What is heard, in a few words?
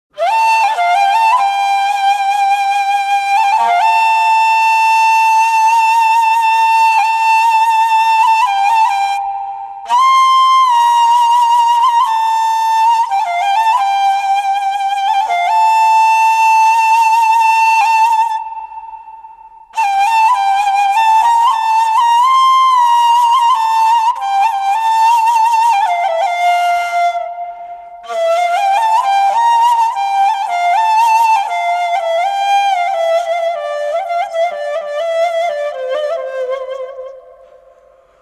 Rington